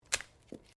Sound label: Branches Dead Snap